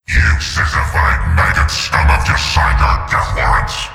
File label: Hell Storm Summon